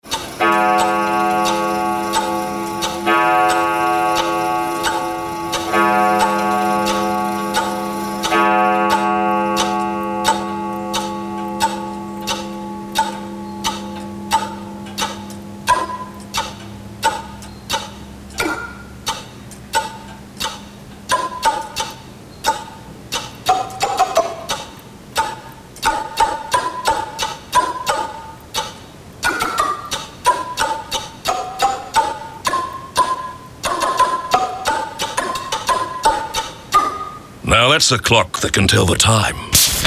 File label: Ticking Clock